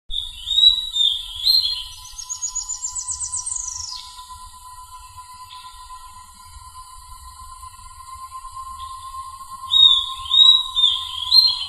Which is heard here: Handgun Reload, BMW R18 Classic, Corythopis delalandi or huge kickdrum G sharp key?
Corythopis delalandi